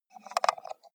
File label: computer mouse wheel scroll slow